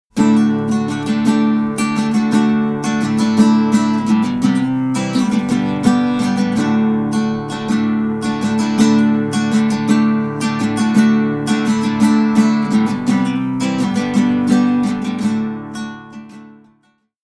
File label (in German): Nylongitarre mit Audio-Techniker 4040